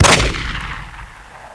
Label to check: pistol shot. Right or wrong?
Right